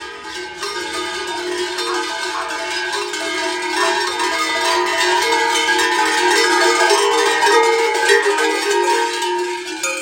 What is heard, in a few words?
Bells sound begging, Kukeri; The bells arrived before the men did.; Kukeri: The Tradition That Keeps a Dying Nation Alive